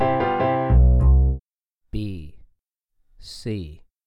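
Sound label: Acoustic Bass 2 Note Melodic Major b0 c1; ear training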